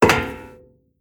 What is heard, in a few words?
Metal Walk